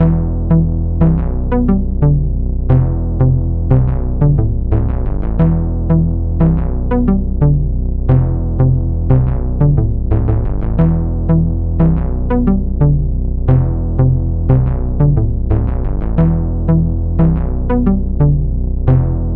Space Music

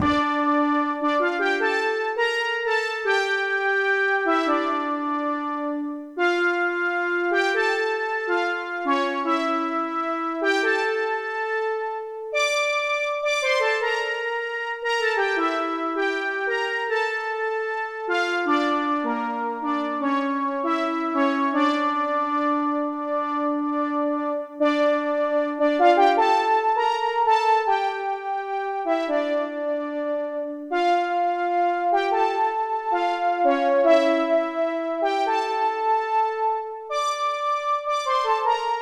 synthesizer

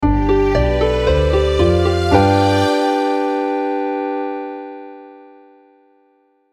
Fanfare

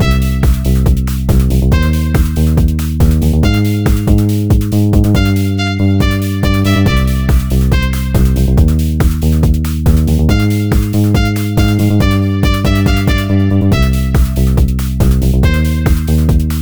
Credits

